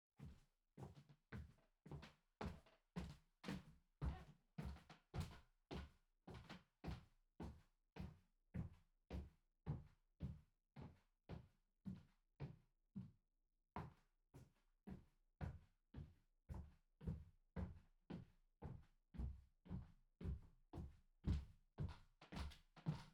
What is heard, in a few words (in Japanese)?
行ったり来たり 中速, スリッパ, 足音; KU100